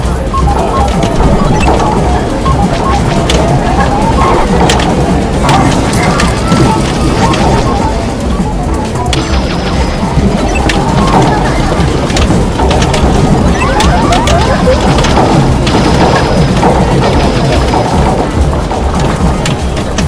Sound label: arcade